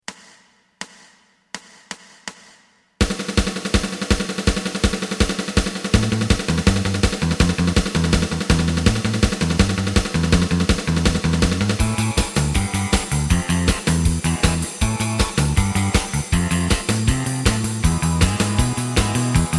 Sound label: lead